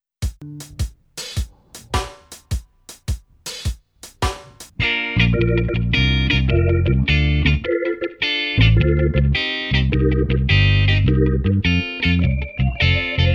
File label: Shuffled Reggae; Bum Bum Tschack Grooves Fills